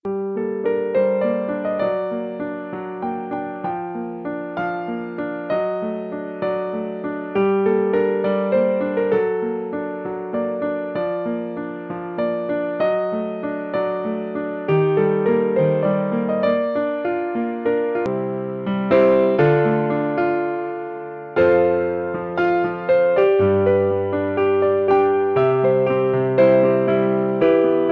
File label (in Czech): vzorek piano